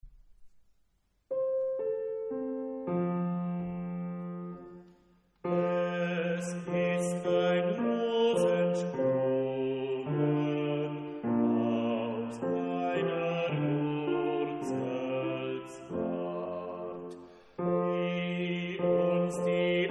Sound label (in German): Es ist ein Ros entsprungen bass